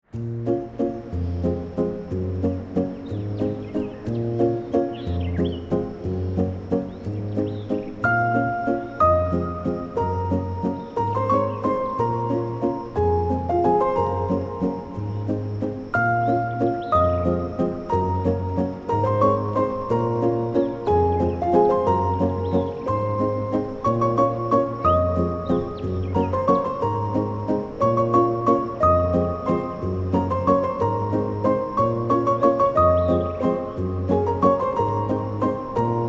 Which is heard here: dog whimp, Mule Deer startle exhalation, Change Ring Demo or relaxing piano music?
relaxing piano music